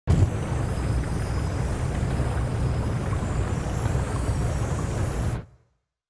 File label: Terrain Gen Goopy; Mountain SFX